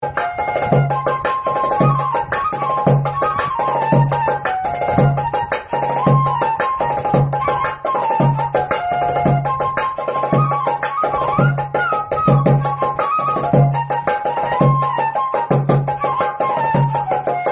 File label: flute